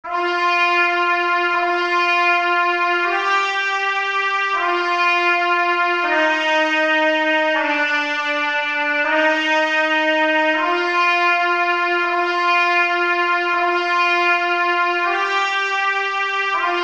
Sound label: trumpet